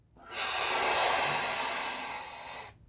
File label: balloon inflate